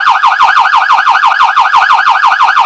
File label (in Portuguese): sirene